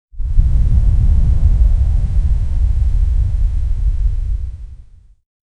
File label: low frequency dry air bur